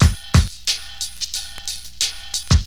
Drum loop, HipHop; LiveAct Pool 1

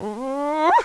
ugh; Princess and the Pea; SoftKey

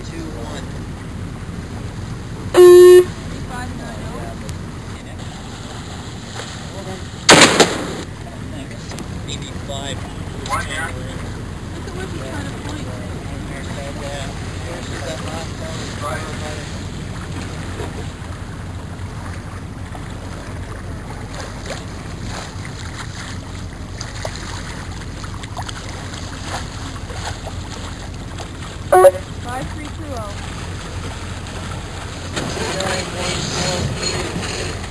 Sailing Race; Soundsation; Human

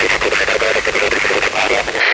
static loop; D3DEMO

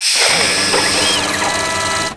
ship arms open; Earth and Beyond; EA Games

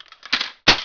RELOAD; Hidden & Dangerous; h&d demo

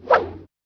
hop spear; Zeus

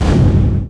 Fire Spread Gun; SWARM

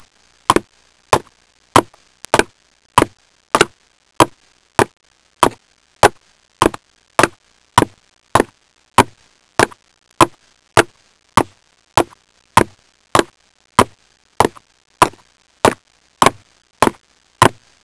Footsteps on Concrete; Soundsation; Human

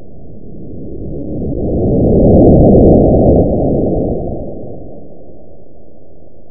swoosh; Death From Above

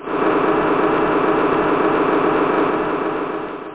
Boou; Amiga

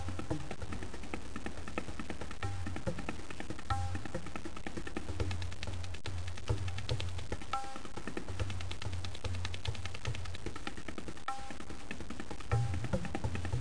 tabla loop; The Amsterdam Marihuana Guide

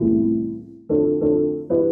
SYNTH HOUSE 125 A, Keys; eJay

